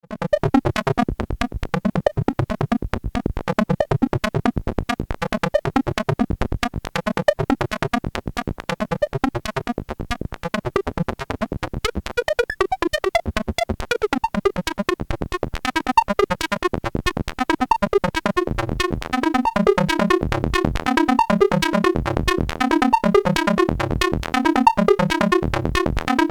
EMS Logik

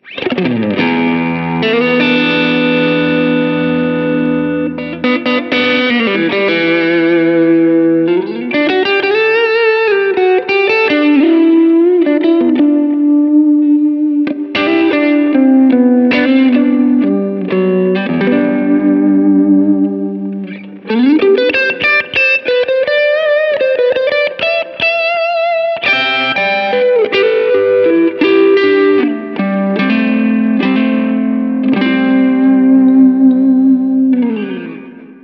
Small Session Board Crunchy Lead

